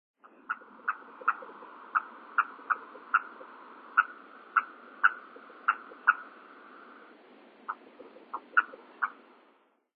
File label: yotaka, nikko